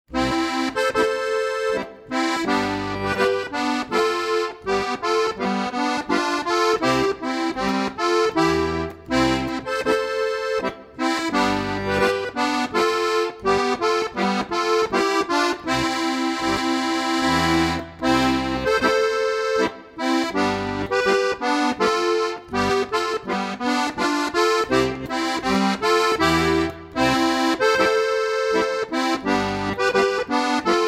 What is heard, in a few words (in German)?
bauernmenuett; Klingendes Akkordeon